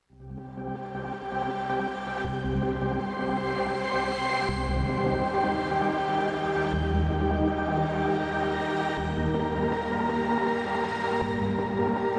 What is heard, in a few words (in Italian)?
Pattern, Sonicware Liven Texture Lab